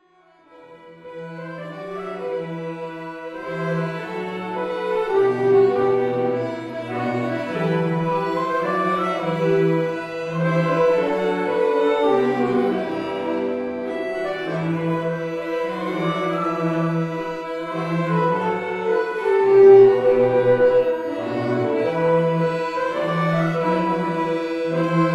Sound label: mazurka